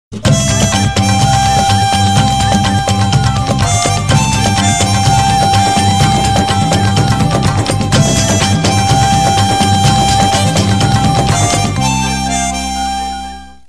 sample ring

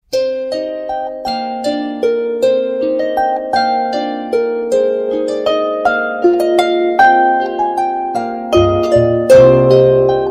Waltz

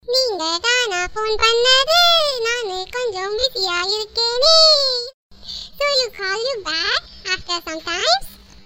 child funny

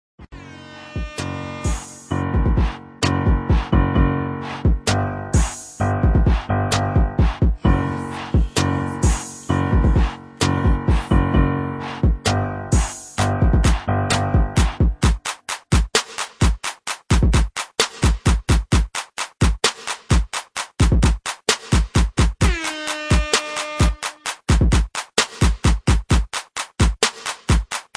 instrumental

